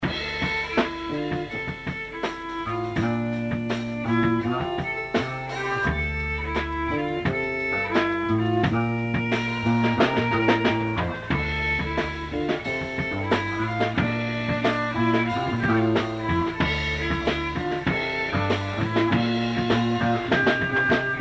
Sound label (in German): Geige und Bass